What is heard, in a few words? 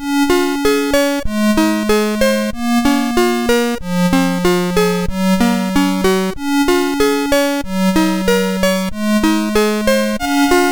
Pachelbel, MOS6581R4